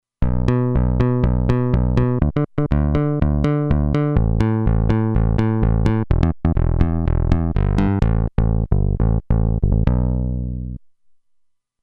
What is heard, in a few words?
Bass, Roland Alpha Juno-2; Factory 2